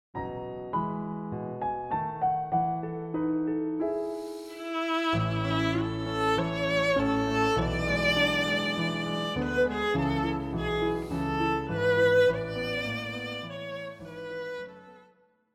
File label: Beethoven violin sonata; op12 no1 movt2; Violin Sonata No 1 Movt II